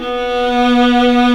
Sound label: VIOLA; 3D Sound - 3D Keys VOL-1